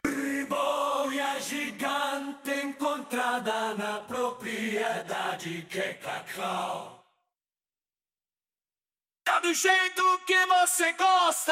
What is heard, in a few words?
Vocals